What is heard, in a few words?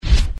ui notice; disneyxd; stuntastic